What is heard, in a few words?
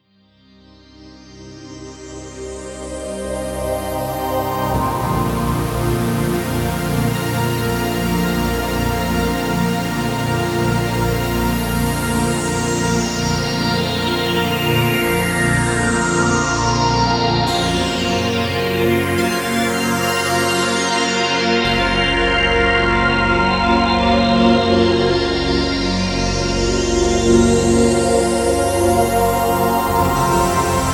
Jupiter X Dual Pad